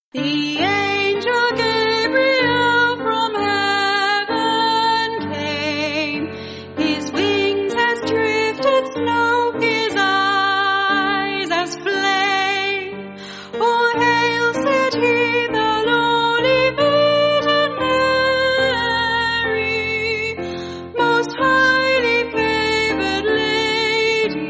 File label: Vocals Piano; The Angel Gabriel; Basque